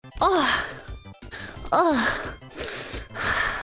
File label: moan